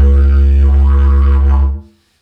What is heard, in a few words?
Didgeridoo Kit Samples